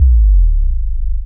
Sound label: SNGL.BASS, Jungle Rave; Best Service Dance Mega Jungle Rave